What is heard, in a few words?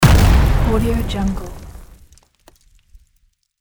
explosion mine